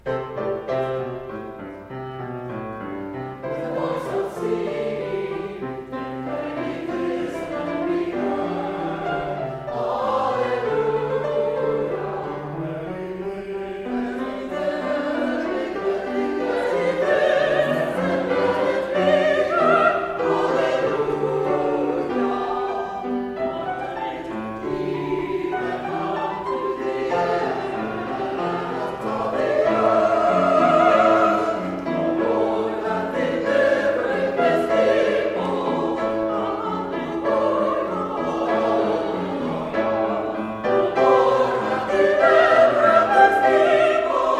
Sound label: with a voice of singing choir